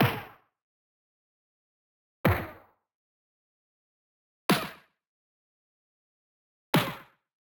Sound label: punch; hyde ucsc hackathon game audio; hyde foley sfx